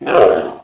skeleton hit; Pirates Of The Caribbean; DUEL

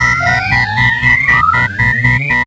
Inf HQ near destroy; Star Wars Clone Wars; XBOX; Xbox Live Effects

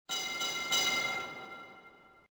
train bell; prague; Soldier Of Fortune II Double Helix; XBOX; ambience

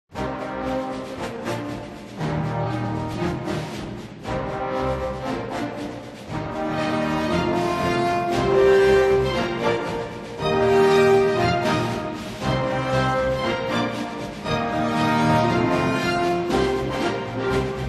Game Over Win; Unreal II The Awakening; XBOX; XMP

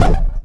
Plasma Rico, Plasma Rifle

